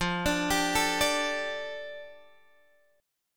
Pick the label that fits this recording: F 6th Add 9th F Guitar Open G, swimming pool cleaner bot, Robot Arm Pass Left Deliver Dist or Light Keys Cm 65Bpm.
F 6th Add 9th F Guitar Open G